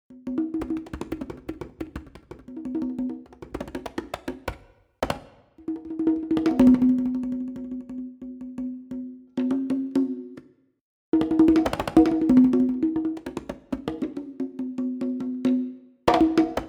congas single hits solo

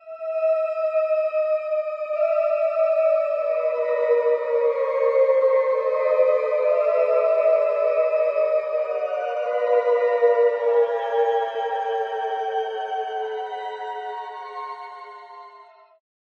incorporeal beings

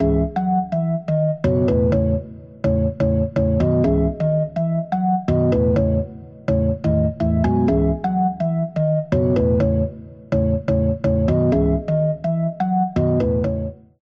spread wide cab organ dry

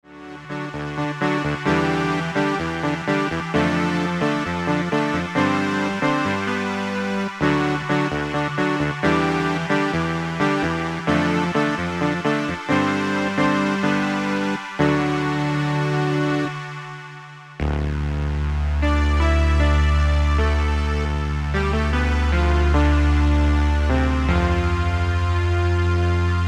Siel analogue

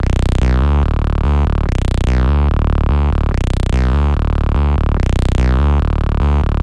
bass

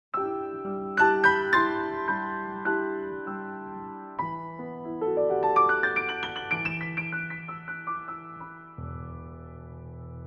hammer mics

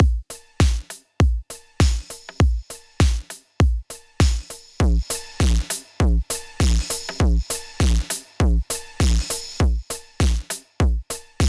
dynamic distortion; flatter

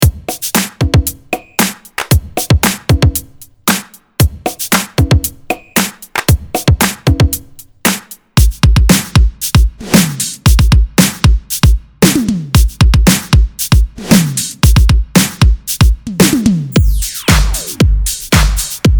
nu disco

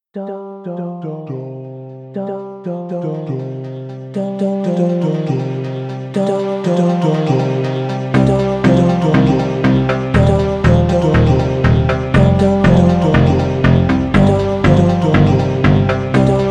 composite keys

